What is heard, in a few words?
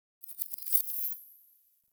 Shrine Puzzle Correct Unlock; Doors; Puzzles